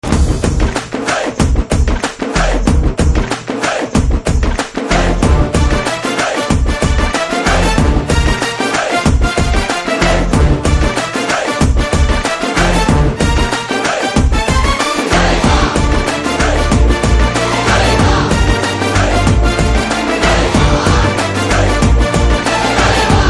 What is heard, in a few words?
Drum Beats Ringtone; Telugu; from Peddanna (Pethanna) Mov